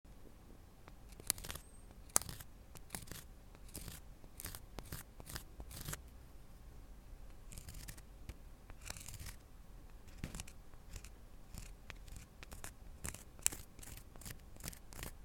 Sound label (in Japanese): 左耳 パリパリ耳かき, ASMR